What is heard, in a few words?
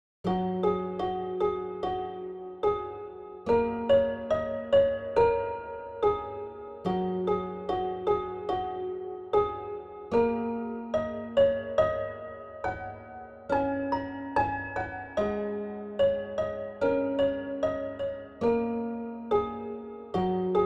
Asian inspired quick improv